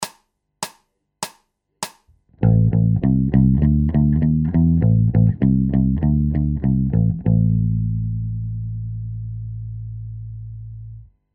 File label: Bass Loops